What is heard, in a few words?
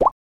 papa